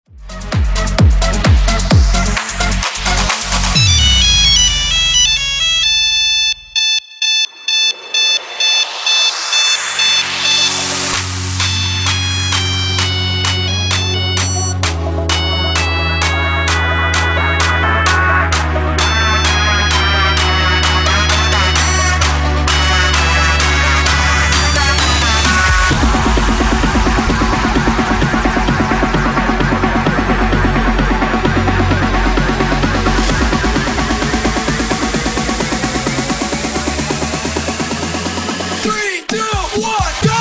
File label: EDM Banger Tempo Down; remixplanet